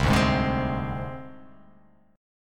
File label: C Suspended 2nd Sharp 5th, chord; Csus2#5 strummed; JGuitar